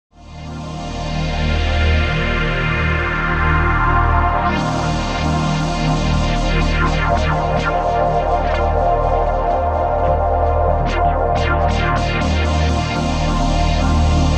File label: Lowpass Morph; Special 2EQ